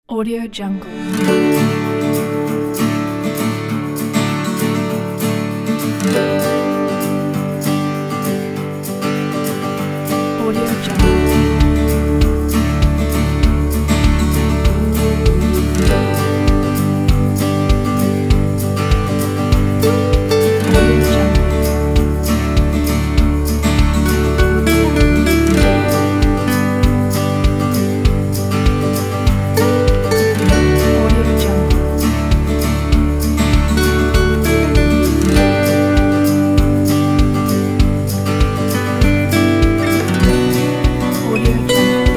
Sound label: indie travel